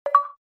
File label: Achievement Unlocked; Silent Hill 3